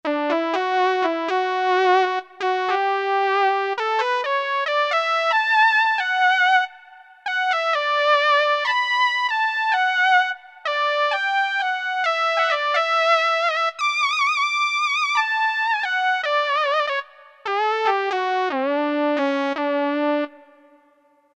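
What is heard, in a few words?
lead woodwinds; E-mu Proteus FX; WynWoods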